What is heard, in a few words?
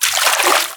Water; FlyForInsanity